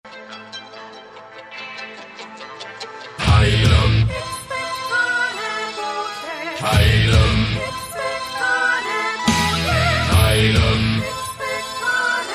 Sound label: Metal Opera